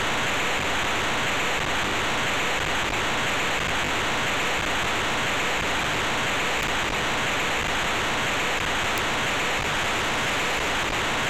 ORBCOMM STX

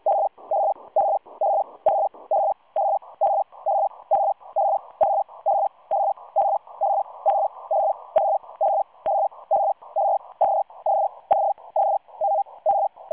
SITOR-A 100 bd 170 Hz shift 9989.7kHz; SITOR-A Cairo MFA

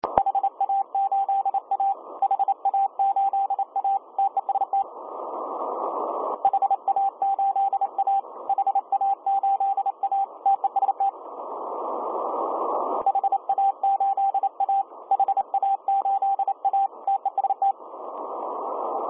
Cw morse

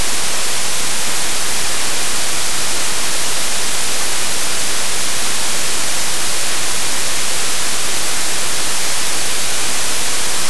DVB

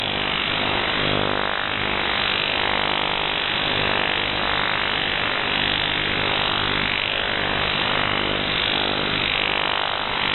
Unid 50Hz FMCW radar usb

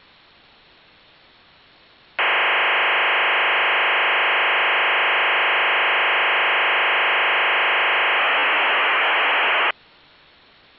4g lsu call

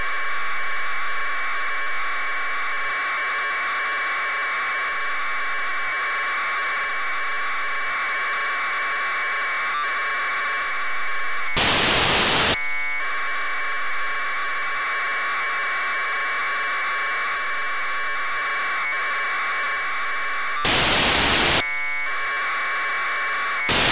AFSK1200 Sound

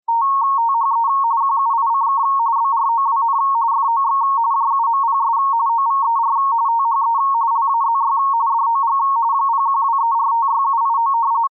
RTTY 170Hz 45.45bd

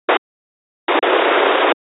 I-ETMS FM

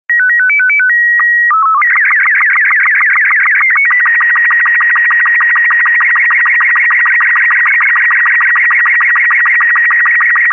Robot 36

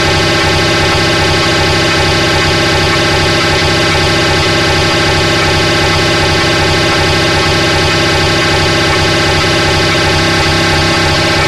EDACS96 Sound

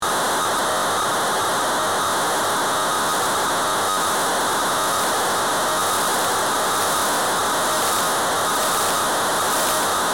DFM06 404,710 MHz

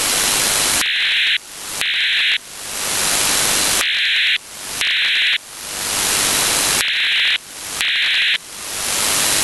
CIS OFDM-121 short-spaced

